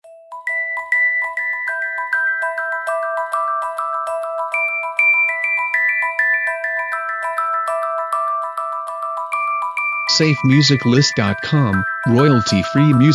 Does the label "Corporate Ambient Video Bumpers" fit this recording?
Yes